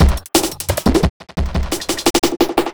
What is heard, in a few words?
Glitched Beat 175BPM; glitch kit